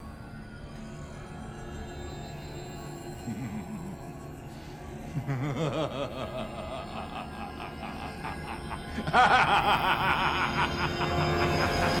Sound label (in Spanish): Risa malvada; Agente Smith; Matrix Revolutions